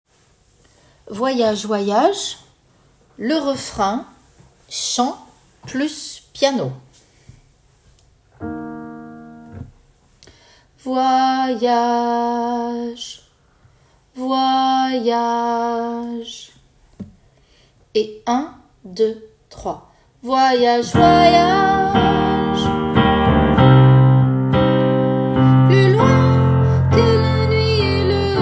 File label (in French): refrain Chant Piano